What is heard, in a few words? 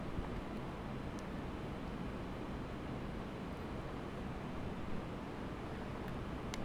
AIRCON EMPTINESS ROOM SOUND